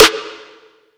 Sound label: Cavern Snare - A#; Cymatics; PHARAOH Beta Pack; Pharaoh Premium Drum Samples (BETA)